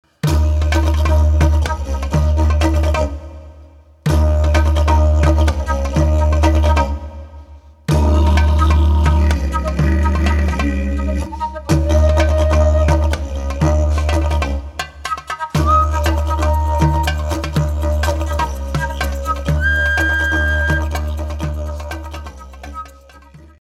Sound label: clay